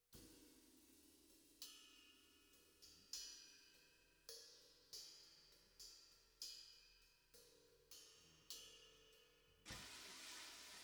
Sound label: SNR